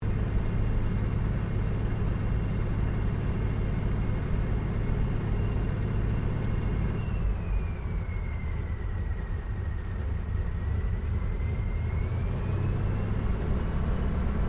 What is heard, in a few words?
AIRCRAFT CA-13 BOOMERANG TAXI INTERIOR; Airplanes; Vehicles; Airplane Sound Effects